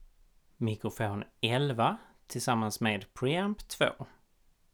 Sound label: mic pre